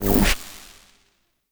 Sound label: Experiment Pistol Shot Stop, Weapons; Warframe; Corpus; Sent Experimental Pistol